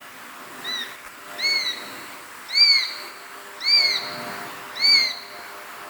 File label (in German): Waldohreule; Freising FS; Daniel Honold